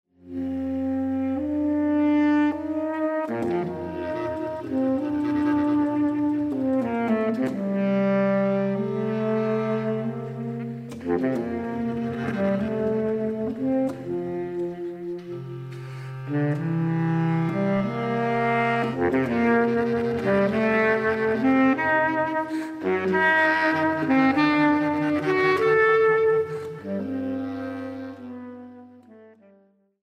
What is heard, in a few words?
Suite n.4 BWV 1010 - Sarabanda